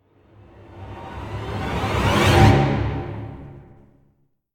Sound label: Fight Music End; Sherlock Holmes The Devil's Daughter